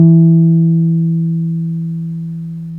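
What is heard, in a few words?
Wurlitzer electric piano E2; Keyboards of The 60's and 70's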